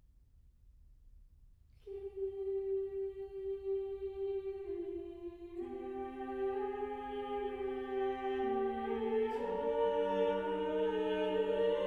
Mass for Five Voices, Kyrie; William Byrd; Masses (Summerly)

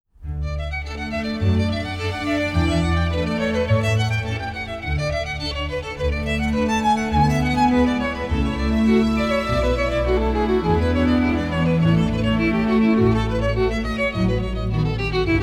Prelude in D major; Johann Sebastian Bach arr. Christian Badzura; BWV 850

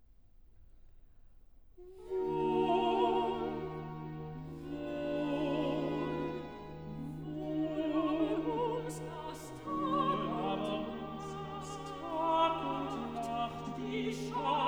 Recitativo (Sopran, Tenor); Cantata, BWV 130 'Herr Gott, dich loben alle wir'; 'Wohl aber uns; Bach; Bremen; Feast of St Michael & All Angels

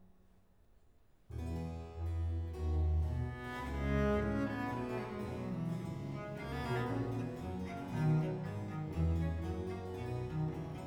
Chorale (soprano); Cantata BWV 199; Ich, dein betrübtes Kind; Johann Sebastian Bach; Gardiner

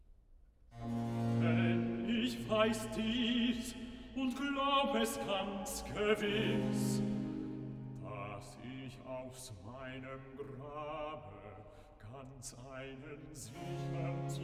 Cantata, Recitativo (Bass); BWV 95 'Christus, der ist mein Leben'; VI. Recitativo (Bass) 'Denn ich weiß dies'; Bach; 16th Sun After Trinity

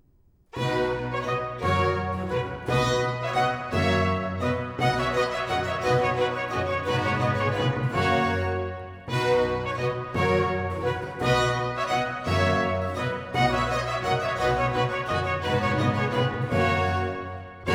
Trumpet Tune - Warlike Consort; Choir, English Baroque Soloists, John Eliot Gardiner; Semi-opera Z. 628, "King Arthur, or The British Worthy"

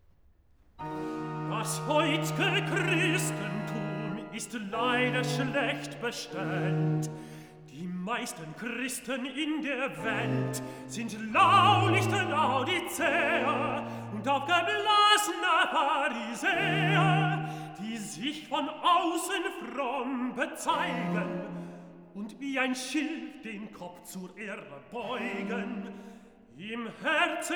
Cantata, Recitative (tenor); Cantata BWV 179; Das heutge Christentun ist leide; Johann Sebastian Bach; Gardiner

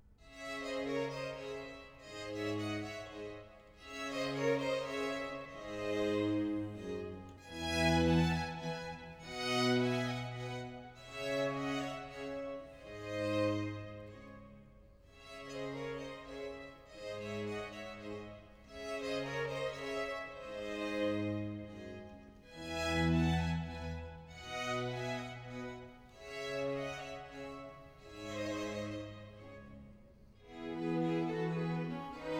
Air; Choir, English Baroque Soloists, John Eliot Gardiner; Semi-opera Z. 628, "King Arthur, or The British Worthy"

